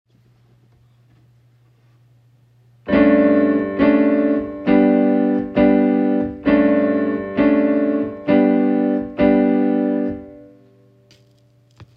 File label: Lydian feel; Shenandoah; G Lydian